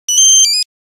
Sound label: Tweet, Nokia Buzzer; Windows Phone apps